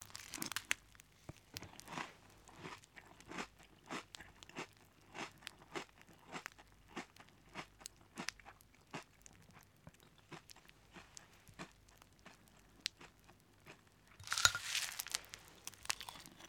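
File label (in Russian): гудение поедания готовой крупы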